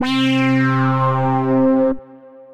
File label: brass